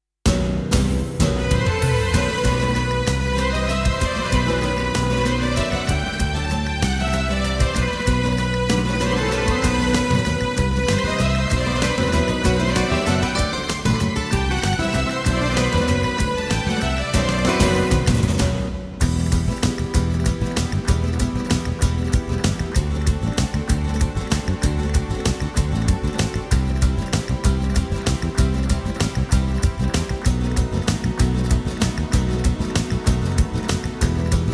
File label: spanish andalusian music; arena de sevilla